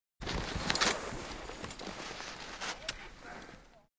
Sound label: Skiing in the snow